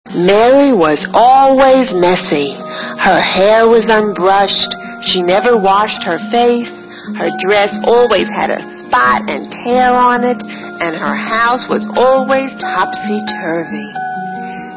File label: bgm